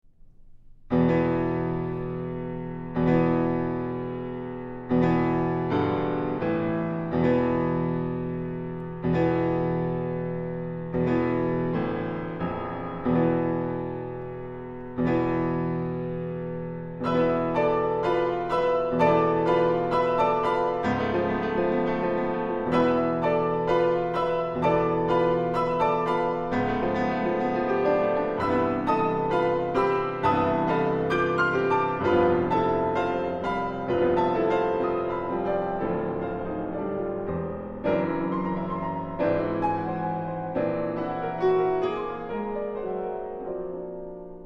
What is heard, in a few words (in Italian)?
Campane